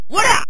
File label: player kick; kung fu master